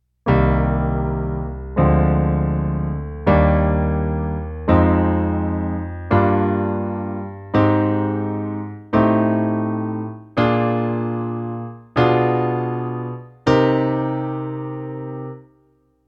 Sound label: Minor Chords; Garmonizazya Minora